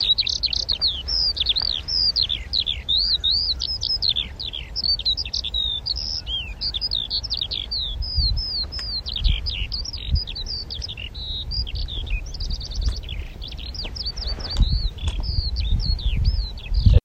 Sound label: larks